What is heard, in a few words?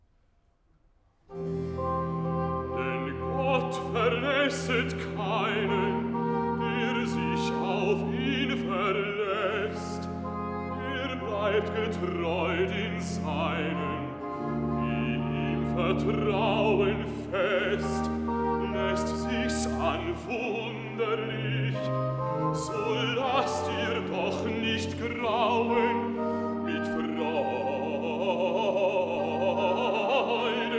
Bass; BACH VOCAL; CANTATAS; BWV 107; Was willst du dich betruben; Versus II; Denn Gott verlasset keinen